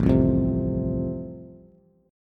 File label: A Minor Sharp 5th A, Bass